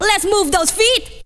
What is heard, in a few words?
VOX GENERAL; Megabass Remix! 2